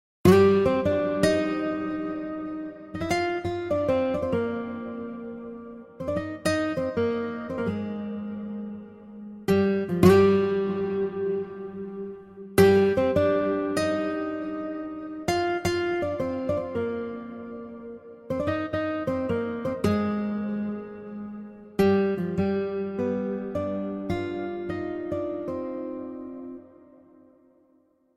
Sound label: Awesome Guitar